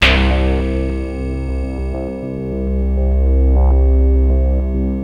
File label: liquid; Greytsounds VOL-1